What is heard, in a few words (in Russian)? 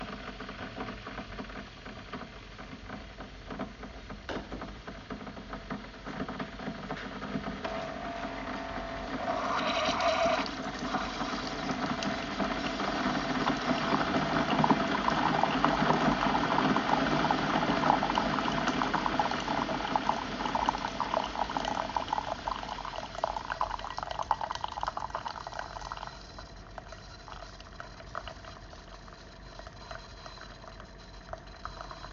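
наливание чашки кофе из кофеварки keurig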